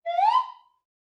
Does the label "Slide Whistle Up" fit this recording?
Yes